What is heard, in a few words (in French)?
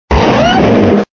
Cri